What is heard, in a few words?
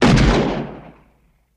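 Gun Shot Single Shot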